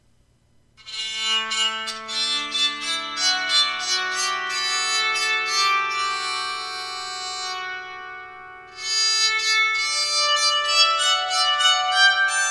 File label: song, bowed psaltery